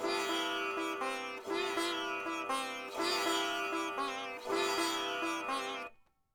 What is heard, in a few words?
sitar